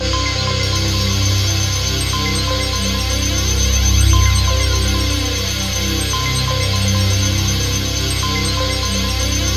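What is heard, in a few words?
soundtrack, ambient atmospheres; Soundscan - Ethereal Atmospheres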